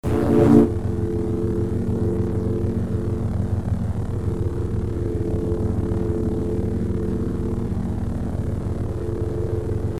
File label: Double Bladed Saber Sound; FXHome